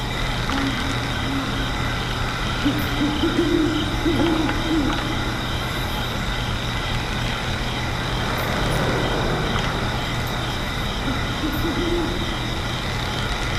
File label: Smuggler's Den Ambience; Angry Birds Trilogy; Xbox 360